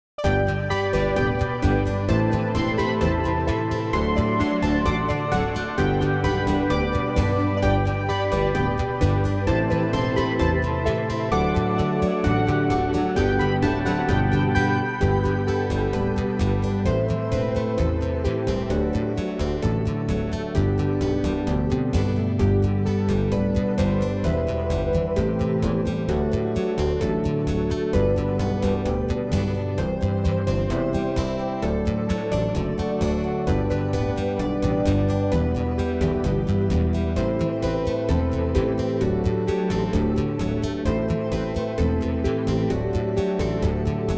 backing; New Hope Music

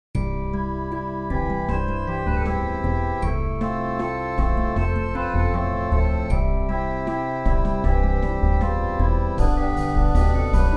instrumental; New Hope Music

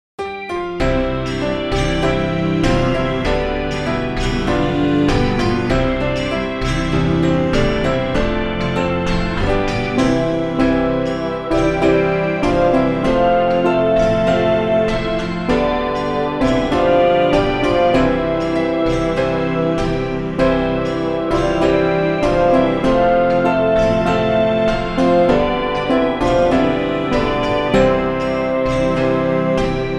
instrumental; New Hope Music

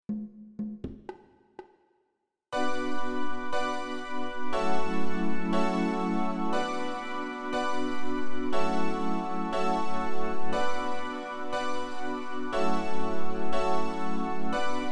backing; New Hope Music

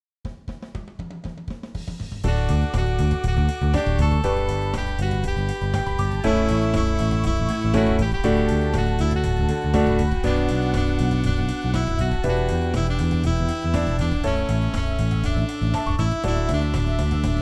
instrumental; New Hope Music

